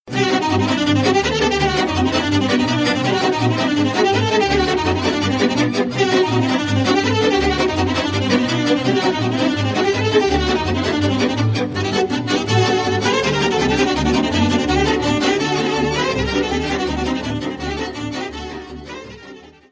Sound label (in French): Ardeleana, Roumanie